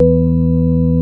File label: KURZ ORGAN E; Greytsounds VOL-1